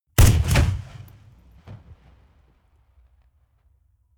rifle ak-47 exterior fire single bass; comedy-cartoon; Pistols and guns; pistolen-gewehre

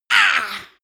female attack

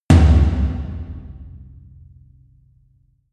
huge tom hits; music elements; percussion

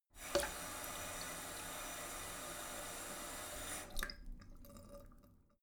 sink fill splatter medium short; haus-garten; badezimmer

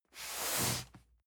cardboard box medium slide; objekte

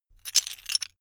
metal pieces clatter short

